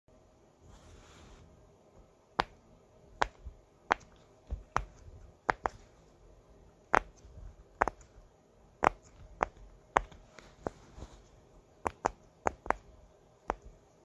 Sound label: Puppet Fidget